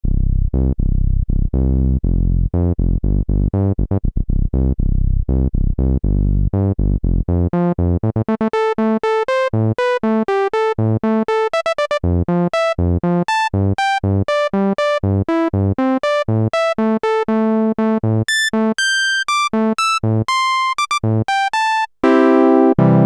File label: e-mu virtuoso 2000 filter smooth lpf 2pole q000